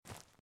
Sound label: run grass; out of reach treasure royale 2020 gamerip pc